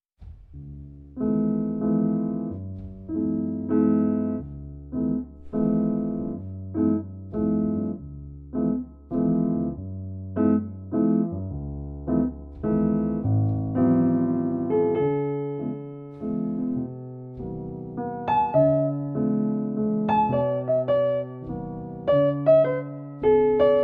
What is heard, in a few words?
Jazz Chill Classy Cafe Jazz Music